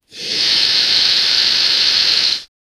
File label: space oven, baking; darth vader